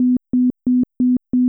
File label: Car Warnings; oviatt